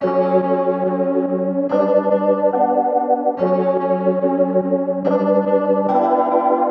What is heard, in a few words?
Various Melody Loop 143 BPM F# Min; Cymatics; Oracle Sample Pack